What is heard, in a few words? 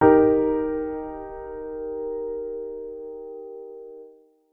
major triad blocked eb